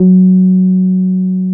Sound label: TB 303